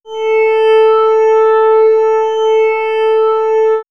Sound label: Swirls Tingle, pads; X-Static Goldmine